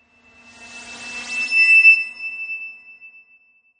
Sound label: Reverse Feedback High Pitch; Scifi Production Elements, Set 1